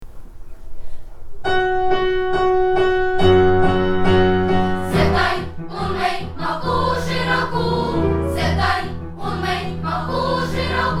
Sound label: chorus